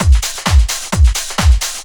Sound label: drum 130 groove; Techno Trance Essentials